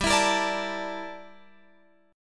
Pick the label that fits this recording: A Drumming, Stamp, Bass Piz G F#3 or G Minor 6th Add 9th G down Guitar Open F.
G Minor 6th Add 9th G down Guitar Open F